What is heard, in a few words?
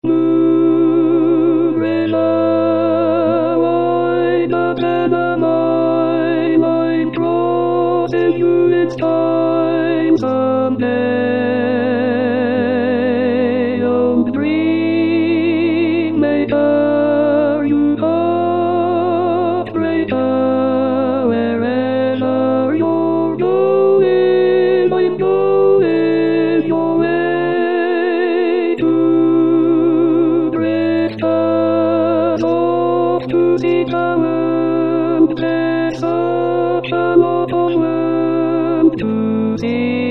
Alto